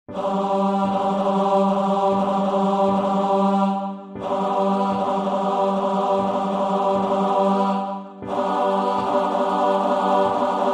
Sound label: All from E